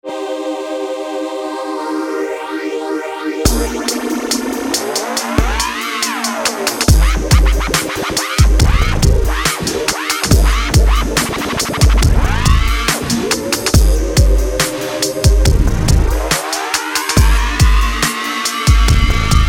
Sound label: dubstep, ringshifter; logic pro